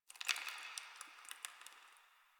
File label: Ice Crunch; Ambience; Warframe; NineteenNinetyNine